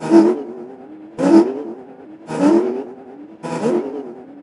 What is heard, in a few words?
ROTOR; USB Soundscan vol.33; AKAI; Disjointed Textures; TECHNICS